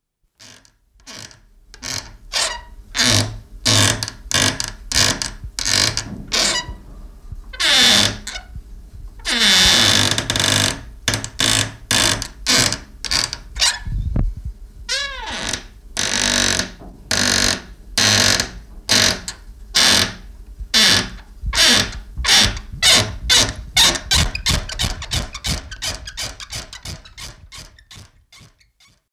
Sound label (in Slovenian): SKRIPANJE MIKRO